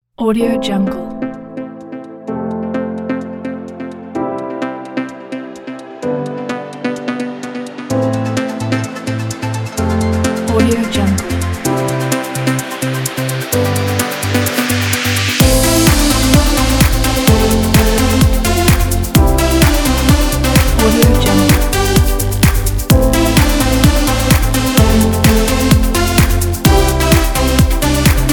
pop dance

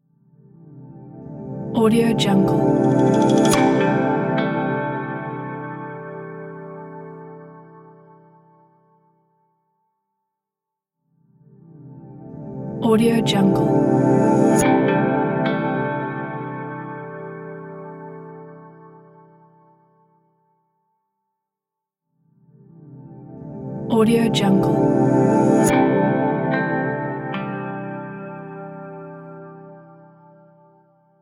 dreamy logo

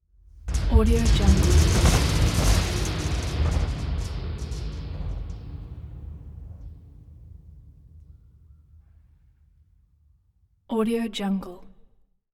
heavy sheet metal drop on floor

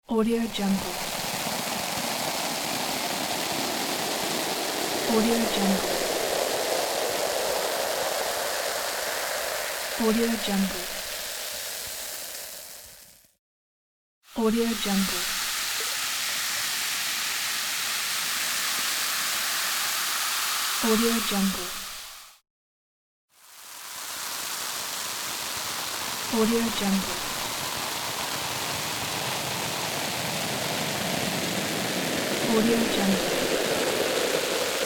soda fizz

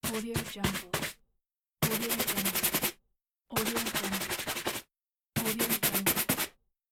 clean shoes

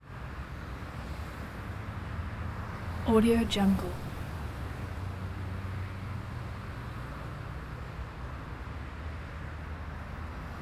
traffic evening mexico city

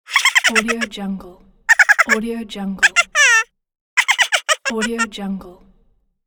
funny cartoon laughs

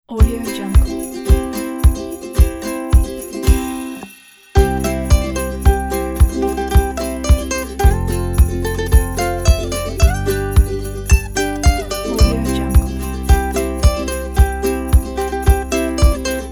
christmas background

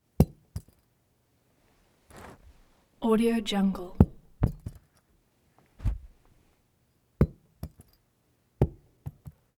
jewelry fall to carpet earrings thud; prosoundfx

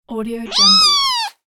cartoon cat sound

